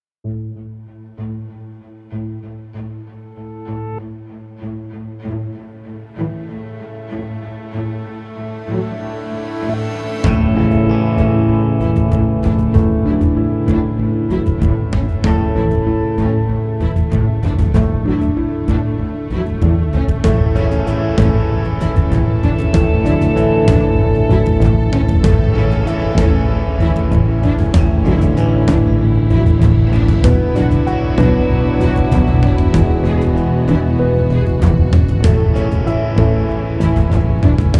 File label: Driving Forward, Hopeful and Anthemic